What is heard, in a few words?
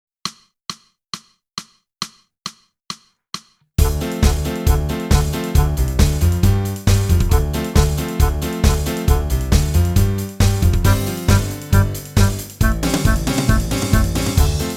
clarinet off the rails; Boosey Woodwind Method: Clarinet (Book 2)